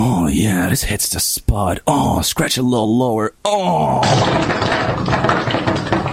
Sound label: fart